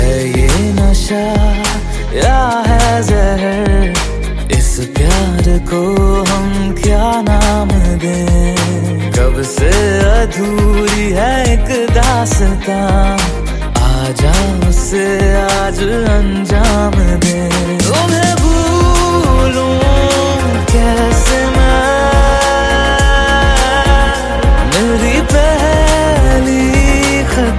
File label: Nice iPhone Ringtone